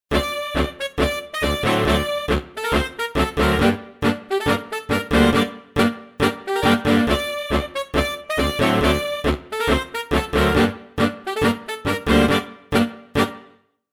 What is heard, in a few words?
Mixed Sax Section; Montage